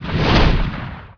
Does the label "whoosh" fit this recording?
Yes